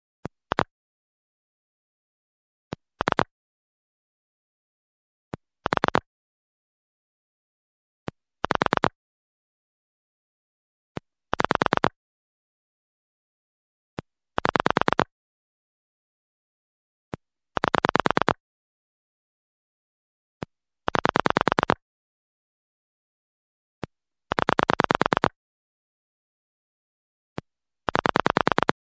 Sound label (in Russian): telefon